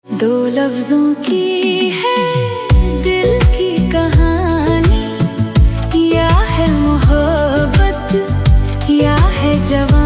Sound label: AI Ringtone